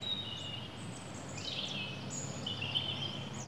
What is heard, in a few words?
birds, ambient animal